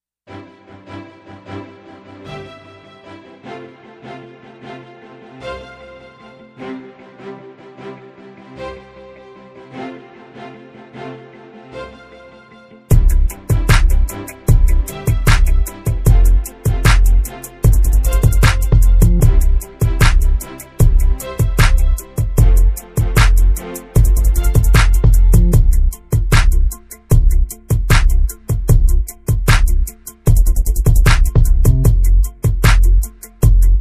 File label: hiphop